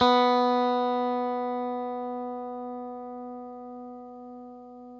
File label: OPEN B, Guitar; Quantum Leap; 90's Sample CDs